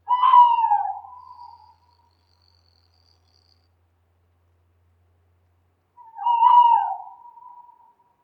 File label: owl; DeadFright